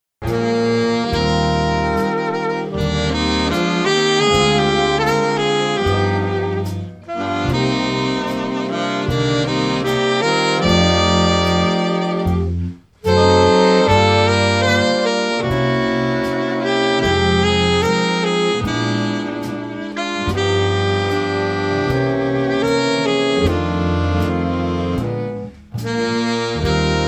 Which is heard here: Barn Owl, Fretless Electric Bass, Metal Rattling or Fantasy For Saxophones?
Fantasy For Saxophones